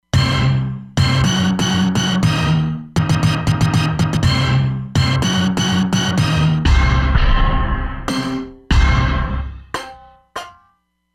Lo-Fi Hit

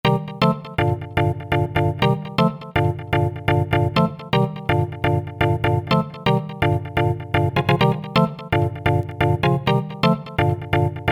Garage Chd; GarageChdSRX

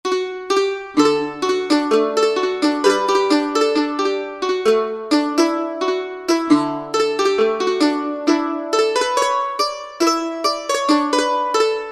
Dyno Mandoln; Country; Roland SR-JV80-17 Country Collection

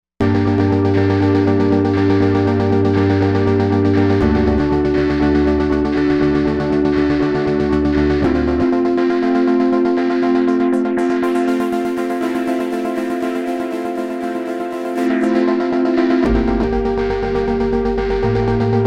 Polypulse

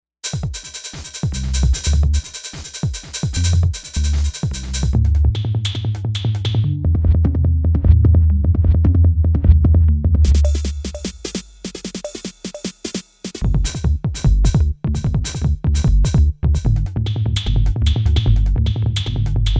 DRUM BASS

